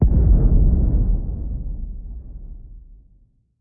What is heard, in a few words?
ambient; World of Tanks